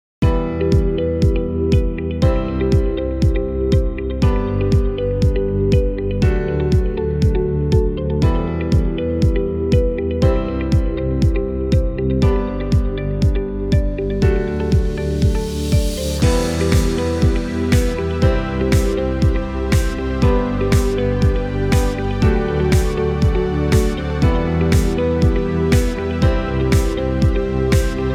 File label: inspired ambient